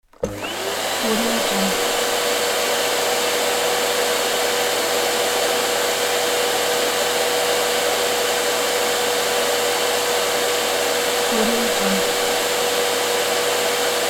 vacuum cleaner

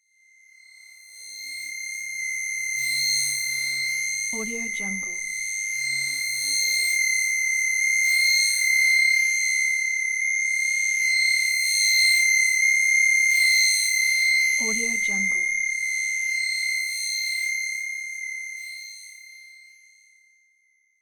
horror pitched noise

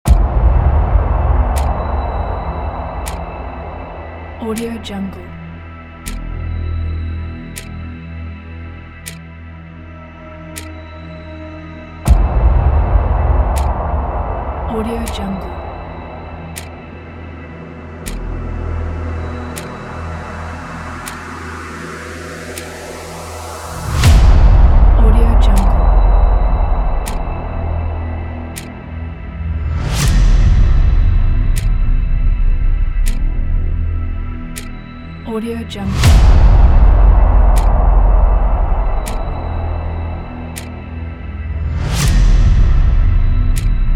tension; zbsbrothers

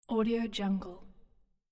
tech click; audioheart

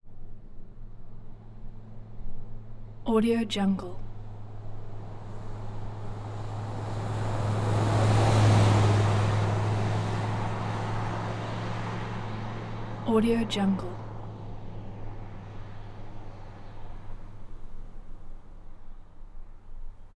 chevy suburban suv pass by medium speed

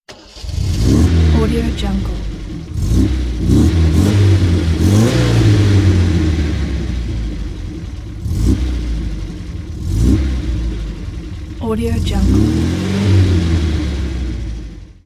sport car revs

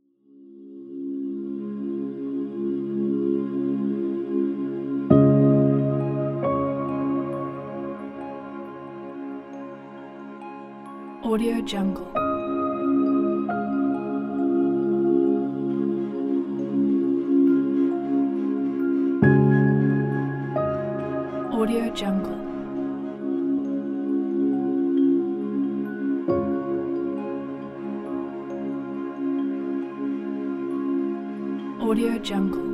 healing soundscape ambient; colorfilmmusic

